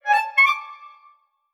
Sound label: Tiptoes